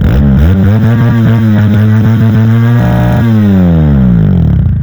Car Rev

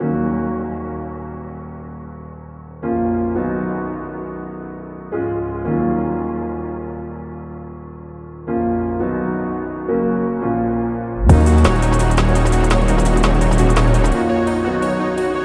Noreaster (Instrumental); Friday Night Funkin' Vs. Ace

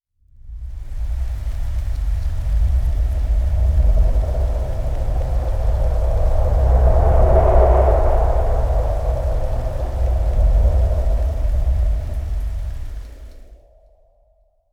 rumbling death wave; a dump in the dark

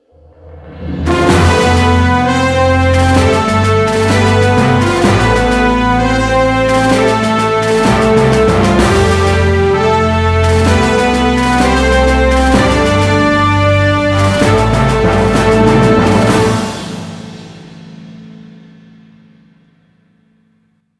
Complete Rescue; Emergency Heroes; wii

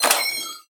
Sci-Fi Weapons-Loading Fx

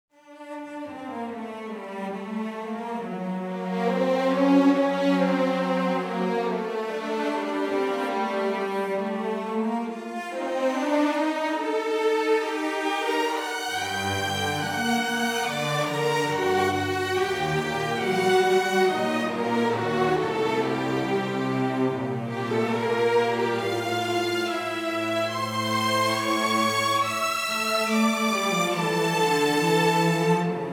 Lonely 140BPM 4-4; Evil Genius 2 World Domination; PS4; Xbox Series X/S

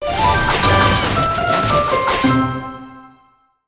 Crunchy Finish

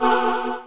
Aah

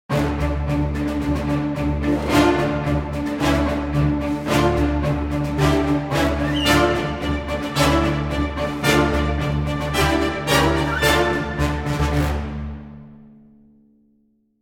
German Victory; Screaming Steel

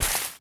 Grass

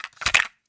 Cassette Rattle

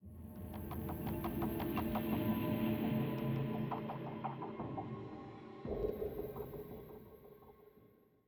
85bpm

